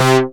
SEQUENCER, synths & keys; Techno Trance

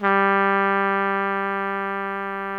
BRASS 2 G2, ORCH BRASS; Greytsounds VOL-1

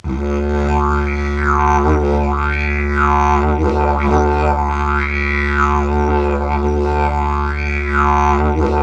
Digiridoo

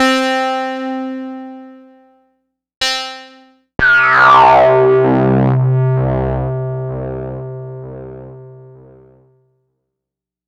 Korg MS-20 Leads and Keys

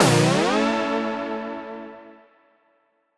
up A, Poly Chord Hits Ramp Up; future rave

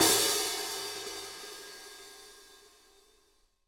Hat Half Open; Digital Sound Factory

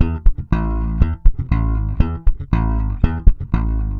SM BS EM, BASS SAMBA

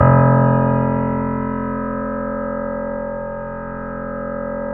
BOSENDORF

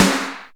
Reverb Kick Snare; PS-37 Dance Synths; Percussion

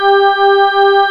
VOCAL WAVE

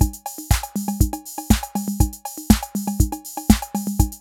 CR-8000 LOOP, DRUM LOOPS

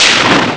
lightning; Amiga Soundtracker Sample Packs

